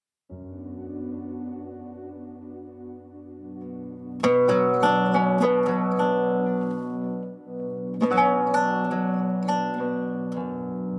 oud pad; OUD TQSIM

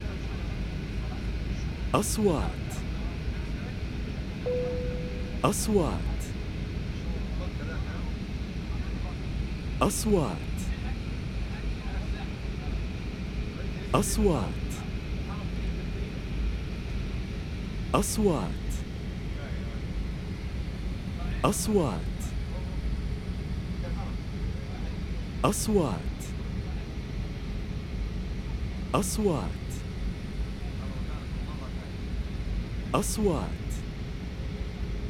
Airplane Ambience Sound; sound effect

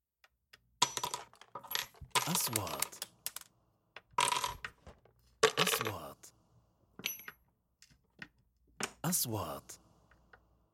Wood

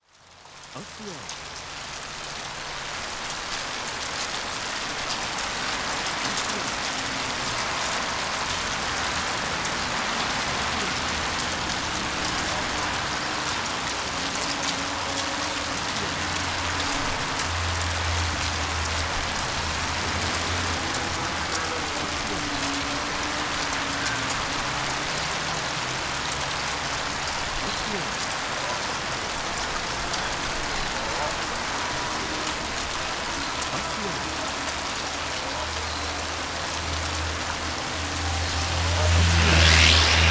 Cars on a road Wth Rine

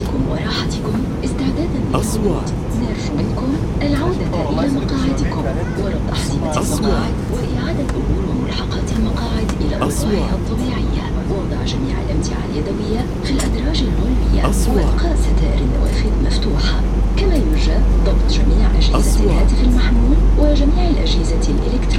Airplane Landing; sound effect

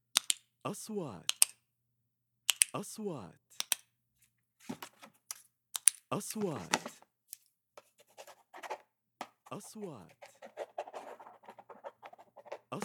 pen open close

